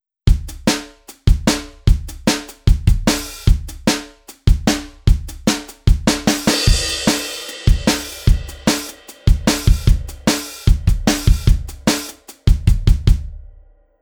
Generique Batterie

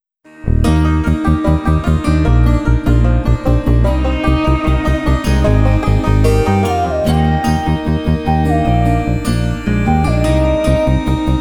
Generique sans percus

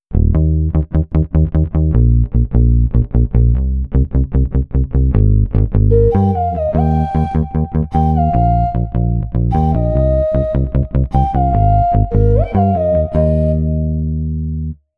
Generique Ocarina et Basse

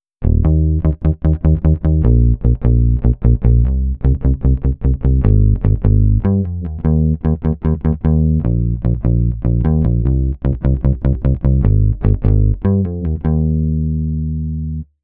Generique Basse

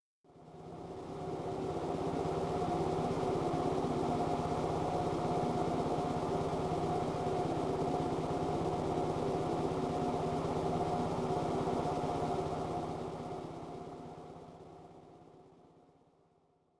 moteur

